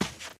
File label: sand, footsteps walk; player; source engine; left 4 dead 2; boomer